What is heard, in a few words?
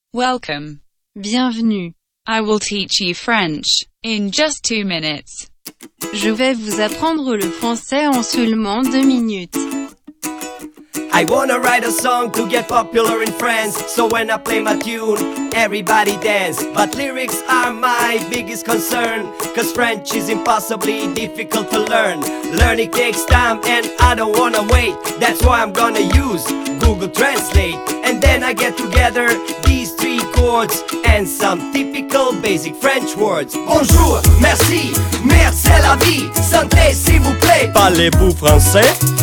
French Song; indie